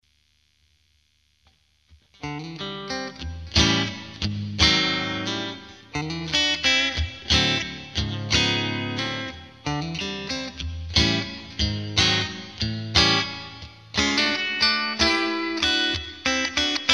Bridge Twin Clean

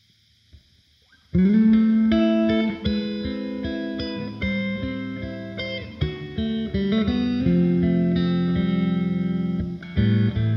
humbucker Neck Fender Twin; classic

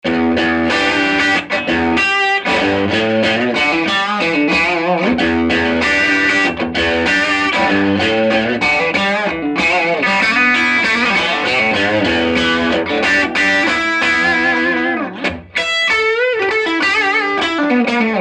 crunch bridge; schwarzbach

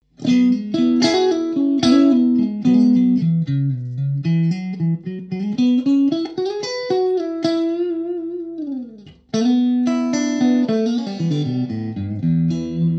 56 clean neck middle, Strat; schwarzbach; VP-Strat-56

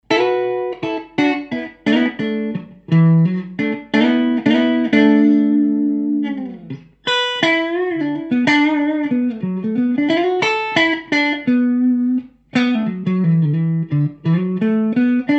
clean middle; schwarzbach

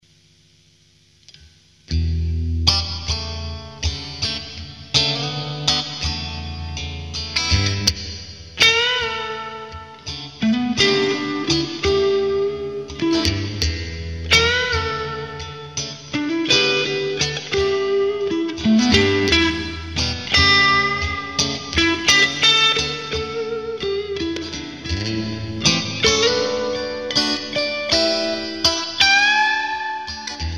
Neck Marsh Stalone Push

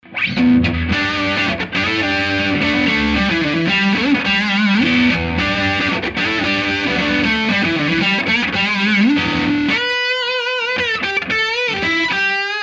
overwound brett neck; schwarzbach

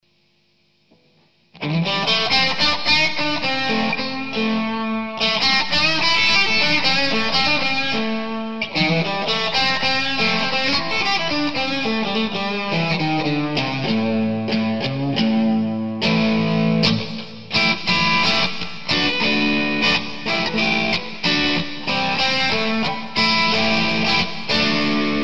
Bridge Marsh Green OD, strat56

